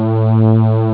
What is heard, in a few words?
string; ST-XX Amiga Soundtracker Sample Packs